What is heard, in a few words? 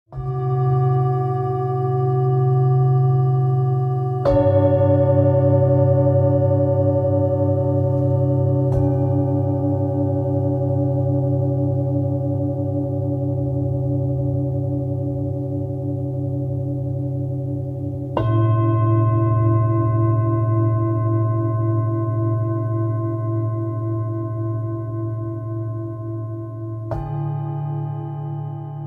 gong; small